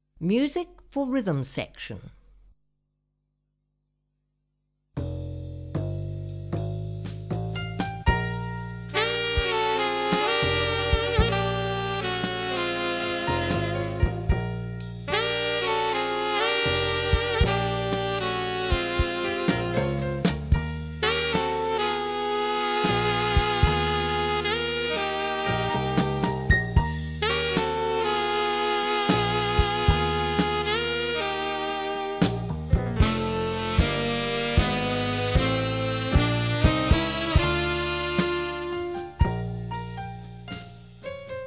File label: Slow Rhythm, intermediate modern; istd